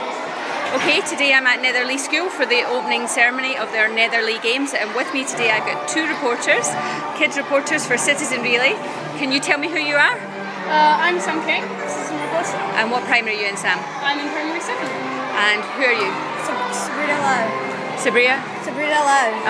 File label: kids at netherlee games